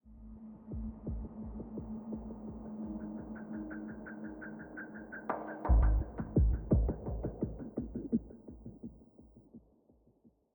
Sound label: 85bpm; Legends of Pegasus; windows gamerip 2012